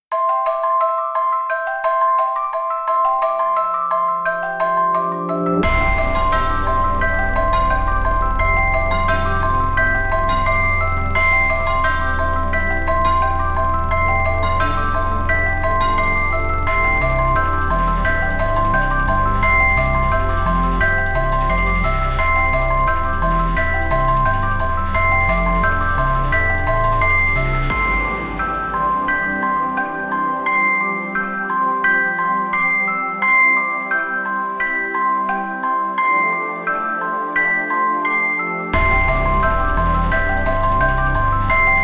bells symphony

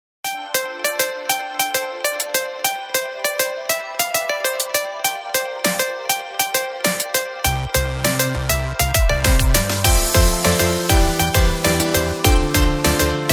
bounce around

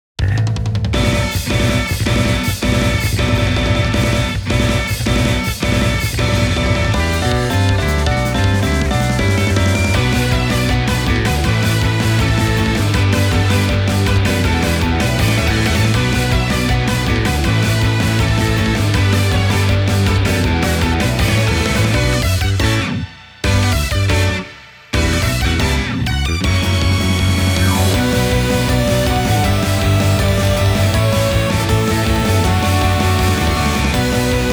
rush

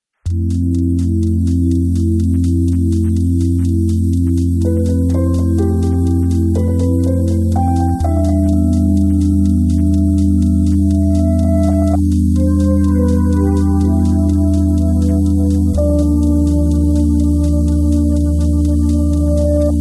Ambient

